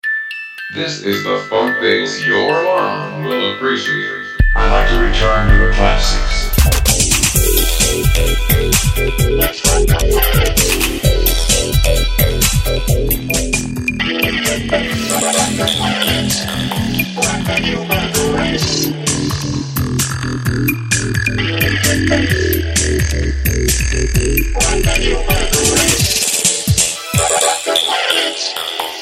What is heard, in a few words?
Robot Remix Sound